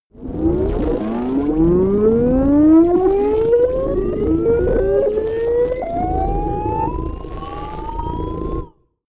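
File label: wobble glitch riser long, science fiction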